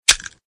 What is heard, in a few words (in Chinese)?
音效